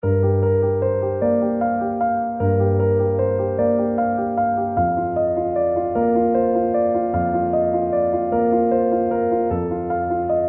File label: Rebirth Piano Novel